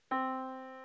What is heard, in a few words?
Yamaha Disk, piano sound